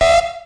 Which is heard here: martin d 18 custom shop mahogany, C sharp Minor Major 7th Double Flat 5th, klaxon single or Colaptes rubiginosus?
klaxon single